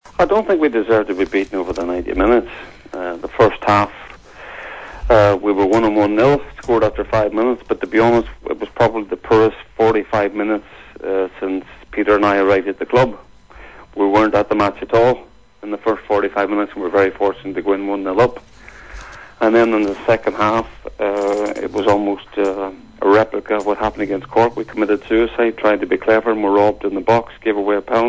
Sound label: HARPS